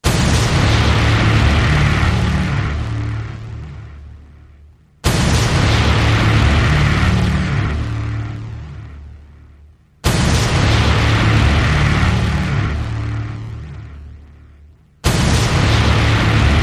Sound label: No Choir